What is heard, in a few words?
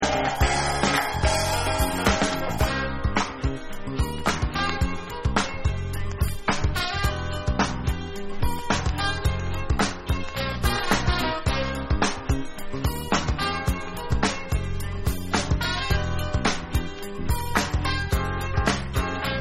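didgeridoo Instrumental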